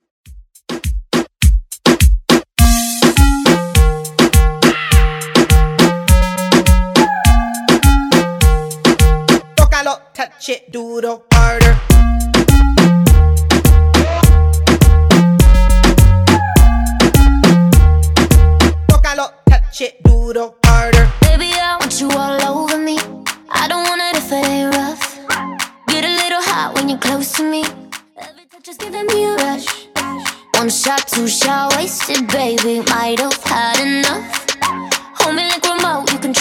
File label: Top40 Reggaeton 103bpm